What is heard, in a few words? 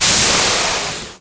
Steam; Finding Nemo; XBOX